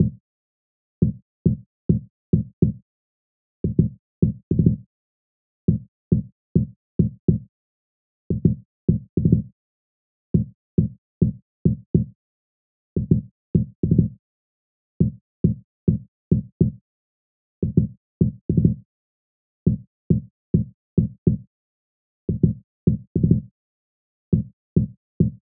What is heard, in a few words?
percussion kick; YTPMV